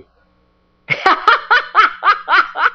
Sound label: cackle